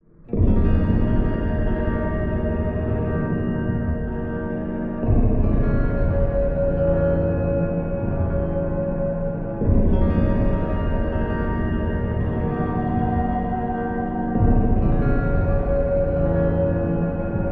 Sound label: Guitar Soundscape Tension; Cinematic Guitars